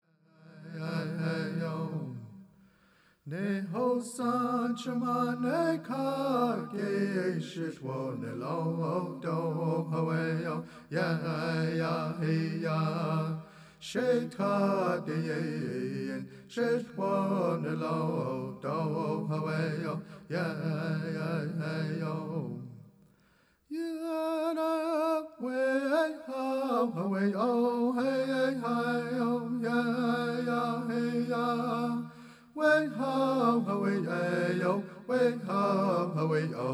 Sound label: Pow Wow Honor Song